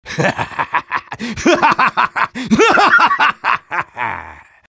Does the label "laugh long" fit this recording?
Yes